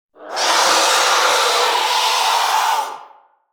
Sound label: creature scream; anemoi